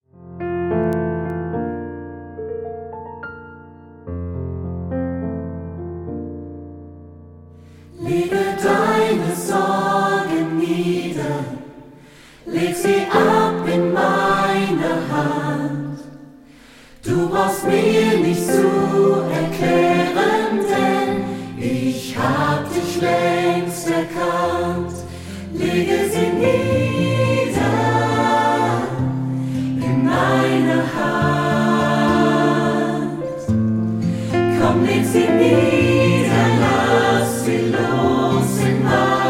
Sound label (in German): StudioChor; Childrens Corner StudioChor